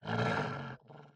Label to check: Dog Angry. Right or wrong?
Right